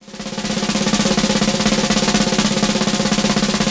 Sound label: Snare Fade In, Drum & Bass, Drums & Percussion, Percussion Fills; FX; Sonic Foundry (Sony Creative Software); Futurist Drum & Bass